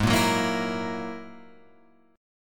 G sharp 7th Suspended 2nd Sharp 5th, Guitar, Open F; G#7sus2#5; strummed; 8,5,6,5,7,5; JGuitar